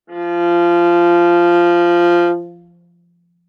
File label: Viola arco ff sul C F3 stereo; MIS Pitches - 2014; Strings